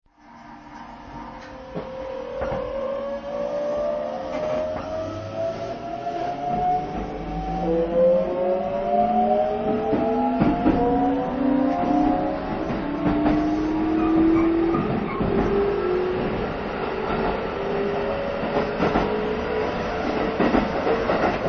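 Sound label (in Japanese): 電車走行音, ＶＶＶＦインバーター：東洋製ＧＴＯ; 立石〜青砥; 京浜急行６００形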